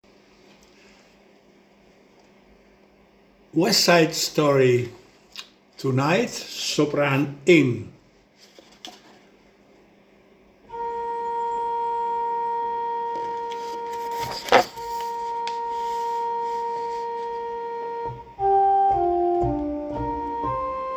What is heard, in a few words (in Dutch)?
Sopraan; West Side Story